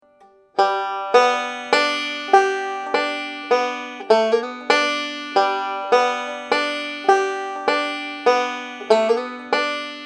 banjo licks

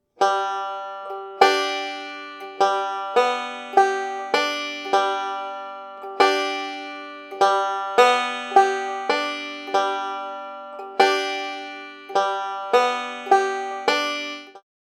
alt roll pinch combo

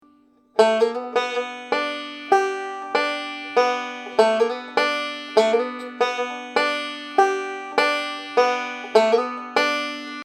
banjo licks

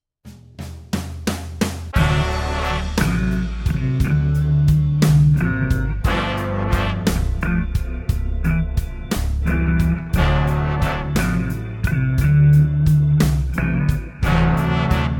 slow blues d

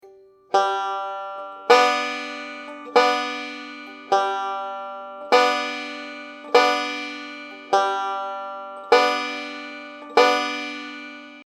waltz pinch pattern

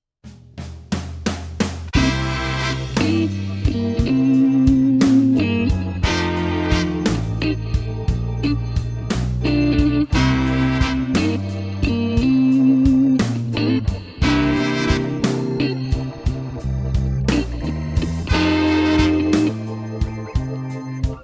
slow blues c

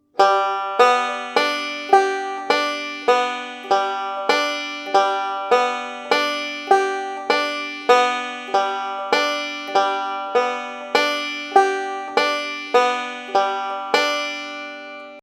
forward reverse roll

